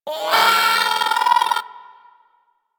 Hyena Micro Vocal Death; Warframe

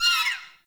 Close Bird; Ambience

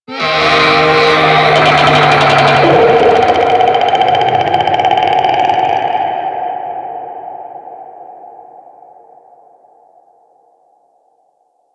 Infested Golem Vocal Attack Short; Warframe

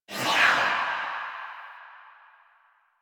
Choir Dash; Warframe

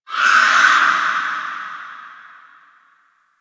Infested Distant Creature Cry, Ambience; Warframe; Corpus Infested

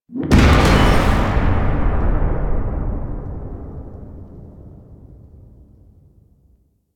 Distant Explosion; Warframe; The New War; Zariman Void Kid Hand Shake

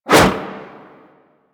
Golden Band Staff Whoosh C; Warframe; Monkey King

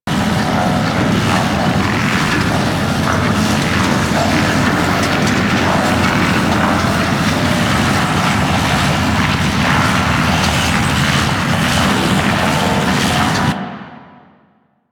Launch Pad Generator Machine Loop; Ambience; Props; Warframe; Corpus Railjack

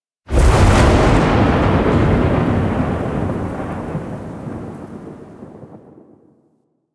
Hand Cast Thunder; Ambience; Duviri; Warframe

